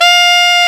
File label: SAX A. MF F, Alto Short; Roland LCDP07 Super Sax